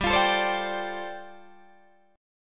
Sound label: G 9th Suspended 4th, Guitar Open D